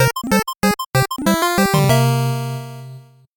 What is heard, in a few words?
8bit jingle fast